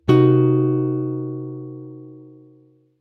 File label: c min7b5, chord